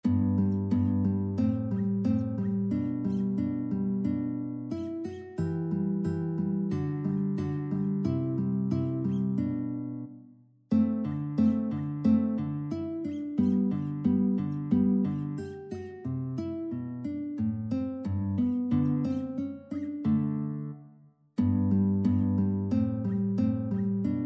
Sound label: andantino, guitar; carulli